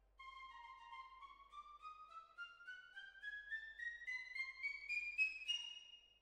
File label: Hall